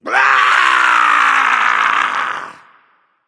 battle cry; smite; voicepack; loki

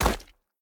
footstep; smite; god tiamat

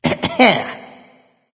clear throat; smite; loki

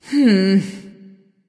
NV Thinking; smite; awilix

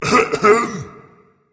clear throat berserker; cuchulainn

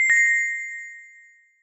hit; smite

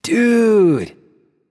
happy; smite; voicepack; osiris

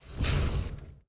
grunt; fenrir

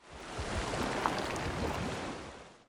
victory waterstep; smite; poseidon

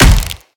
impact crunch; Smite; Cabrakan

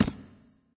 staff ground; smite; odin

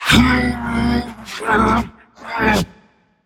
grunt lift; smite; ravana

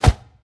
whoosh; smite; raijin

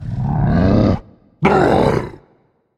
battle cry; Smite; cerberus

